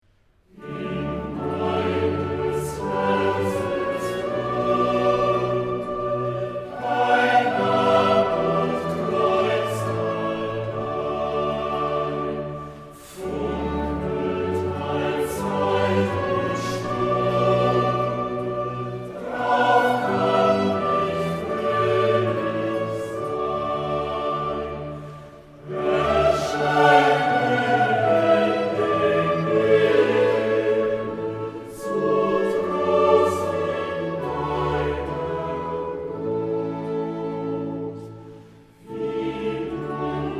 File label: Chorus; In meines Herzens Grunde